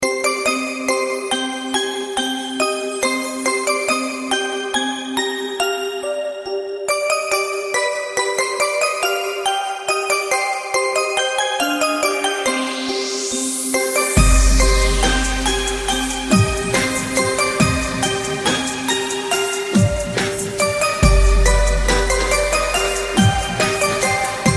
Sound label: Miracle Bells